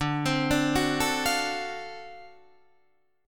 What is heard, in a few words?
D 7th Sharp 9th D down Guitar Open D